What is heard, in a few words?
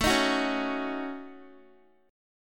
A 6th Flat 5th, Guitar; A6b5 strummed; x,12,13,11,13,x